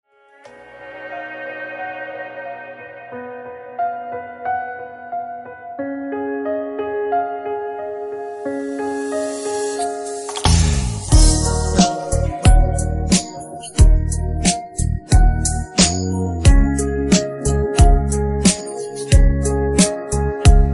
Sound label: beat, pop